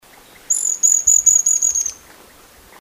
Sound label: Ochraceous piculet